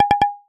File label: error; point of sale; odoo